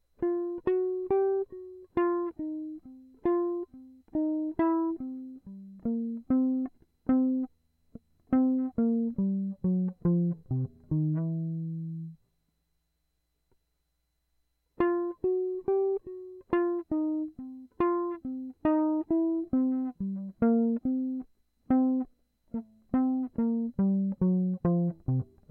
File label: cool tones lick 4